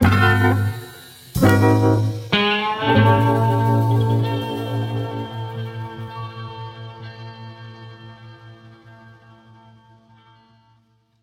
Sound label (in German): schlussakkorde